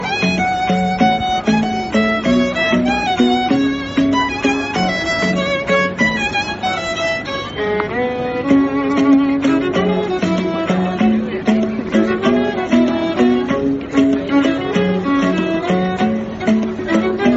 violin ringtone

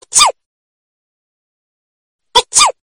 cute sneeze short message tone

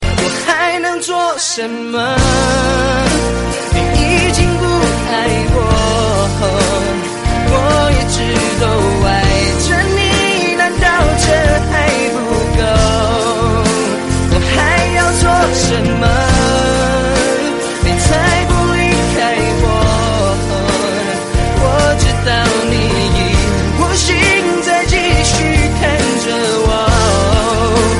chinese song ringtone

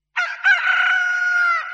hen sound alarm notification tone

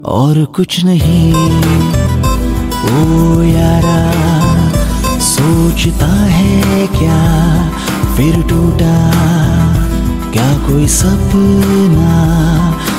hindi ringtone, bollywood

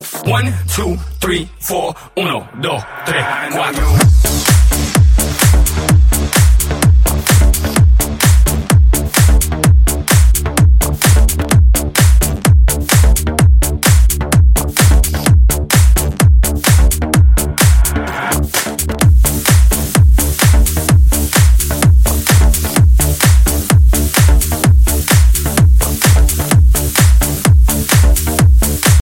mega dance ringtone

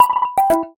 momentum notification iPhone 8 ringtone

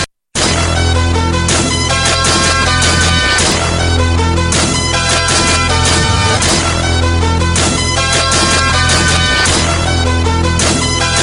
hip hop adrenaline ringtone

